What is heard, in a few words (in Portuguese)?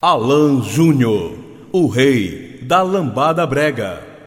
vinheta